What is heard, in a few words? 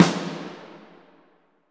SNR SONAR, Orch Snares